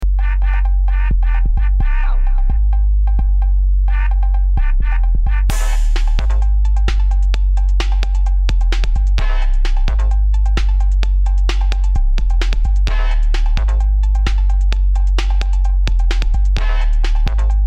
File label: Bass Test